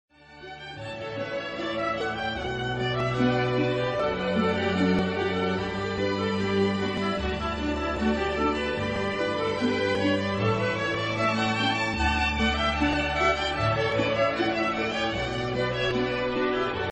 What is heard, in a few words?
canon in d ringtone